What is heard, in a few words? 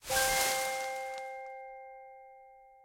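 wild convert start, slot machine; wingsofriches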